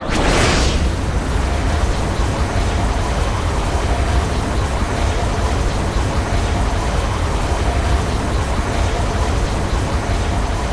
Active Loop; Active Charge Loop; gamerip; Enemy Boss Common; Se Enemy Bigbumpo Active Loop; Se Enemy Majin Active Charge Loop

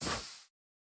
Fire Extinguish Band Aid

